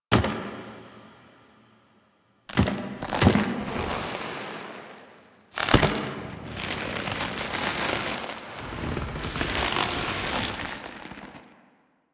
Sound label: Kiss Foley; Cinematics; Ballas; Warframe; The New War; Throne Room; Quests